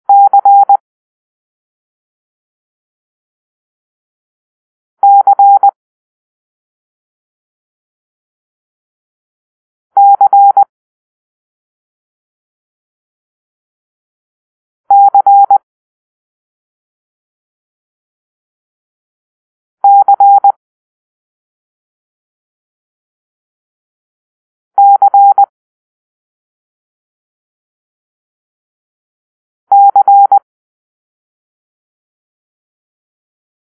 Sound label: Charlie (C), morsecode